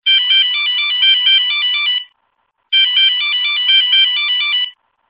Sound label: nokia old klasik; nada dering nokia jadul